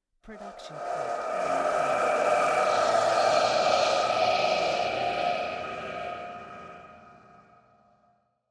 windy swell; soundscrate

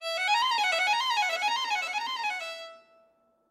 Violin Whole Note Runs

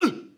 man injured grunt; soundscrate

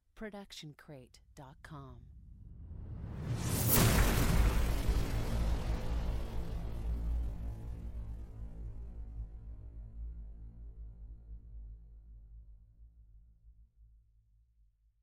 Sci-Fi Charge Up and Blast; SoundsCrate

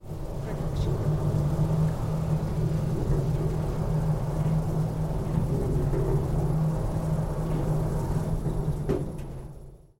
elevator multi floor wide; soundscrate

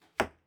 archery foley arrow impact

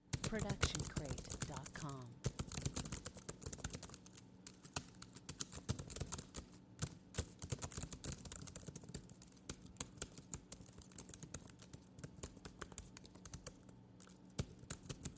typing computer keyboard; soundscrate

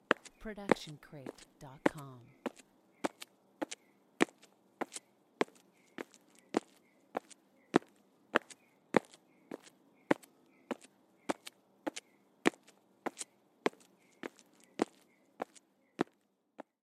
walking outdoors ambient; soundscrate

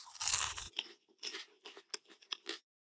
chew; soundscrate

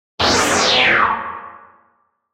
Anime Super Laser

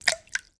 water drip single; soundscrate

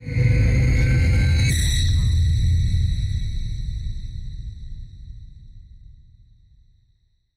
cinematic rise hit; soundscrate

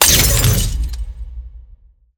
metallic crash; soundscrate

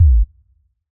electronic kick short; soundscrate

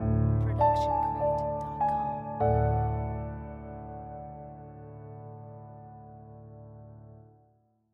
Corporate Piano Ident; SoundsCrate

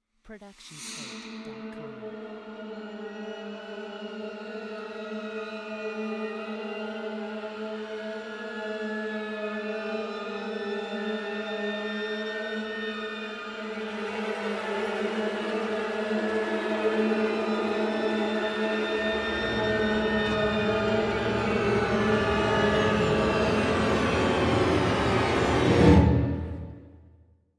screeches bends gliss

